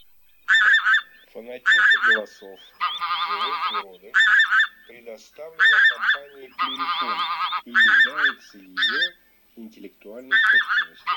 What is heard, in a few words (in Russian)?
Смешанный базар Oche mix; plurifon